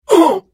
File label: Hit; The Elder Scrolls III - Morrowind